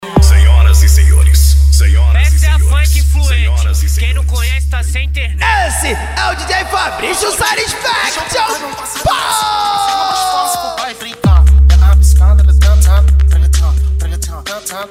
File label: funk mega bass